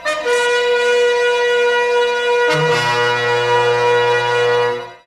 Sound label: Puzzle 3d Jingle Danger Sign